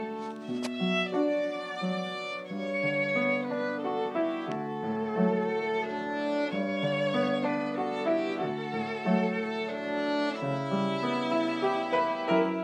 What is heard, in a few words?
piano and violin